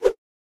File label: sword swing; smite; npc; olympus